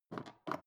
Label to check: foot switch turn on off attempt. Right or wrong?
Right